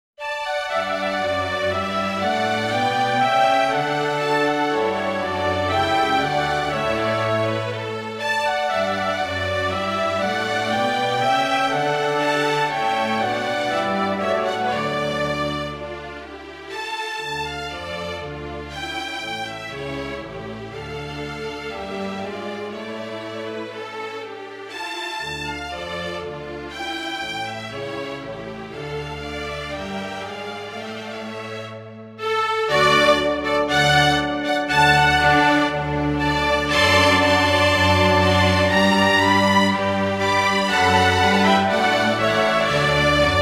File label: ballet-pantomime, MIDI version; ninette a la cour; STOCKHOLM VERSION